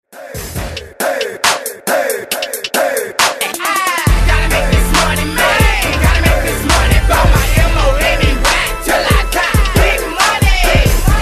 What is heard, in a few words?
Extended Redrum